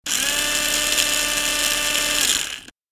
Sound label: Remote controlled sport car road movement; rc toys